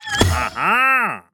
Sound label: appeared, wandering trader; mob; Minecraft